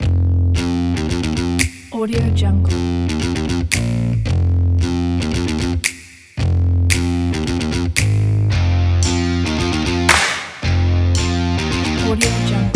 stylish funky rock loop

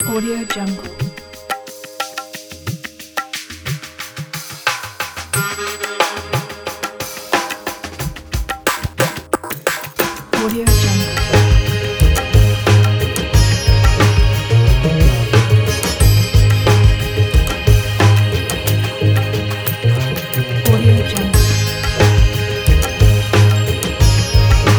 india sunrise

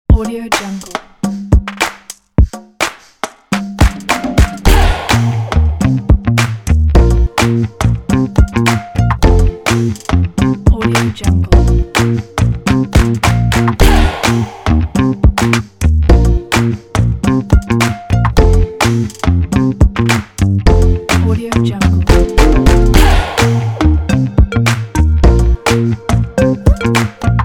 summer beach life

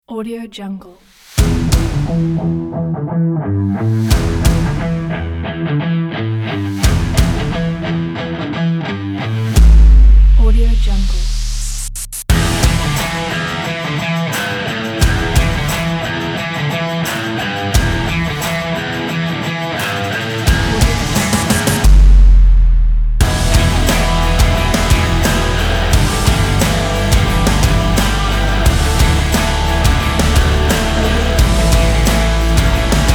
sport rock; alexguz

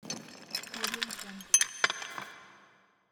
creepy horror metallic iron chain rattle

